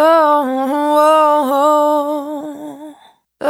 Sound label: Urban Dry, Single Voice Melodic Loops